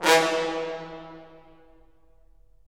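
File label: brass cimb, Roland; Roland LA Composer vol. 4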